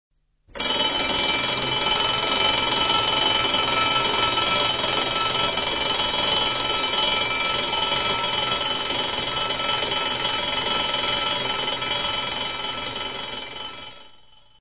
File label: Alarm Clock; orologi